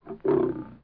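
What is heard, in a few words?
hellhound; DarkDemo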